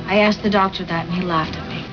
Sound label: laugh